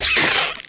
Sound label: insect attack; Insects